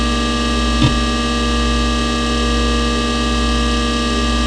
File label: pc hard drive